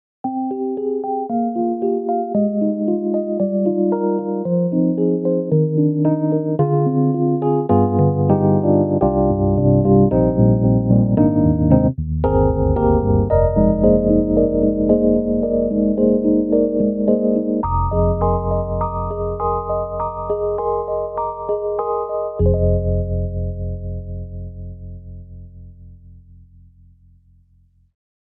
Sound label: US Rhodes; EDIROL; Super Quartet